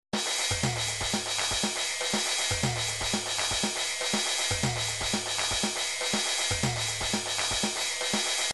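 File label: drums pattern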